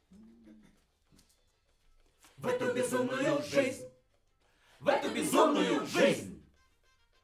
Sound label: Backs SCHOEPS